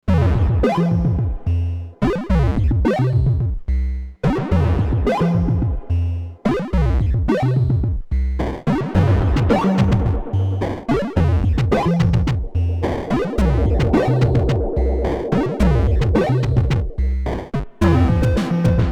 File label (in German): Chiptune Dub leichte Seitenbetonung; SPL Gemini